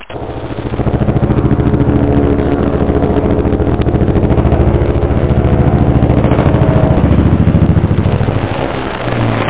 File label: GUNSHIPS